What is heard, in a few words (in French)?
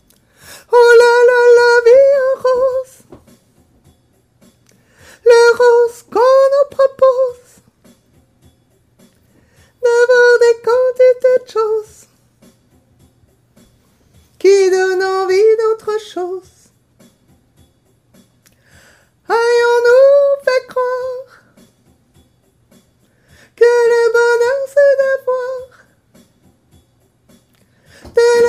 sop couplet